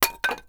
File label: wood Break; voxelverse